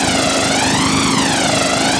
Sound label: tracer tow, guns tracer; World of Tanks Blitz